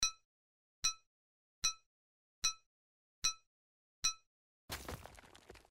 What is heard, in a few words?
miner